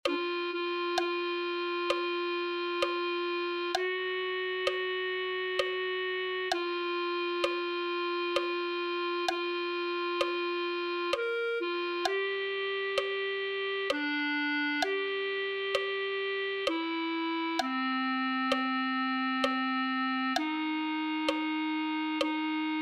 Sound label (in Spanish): clarinete